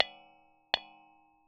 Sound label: contact perc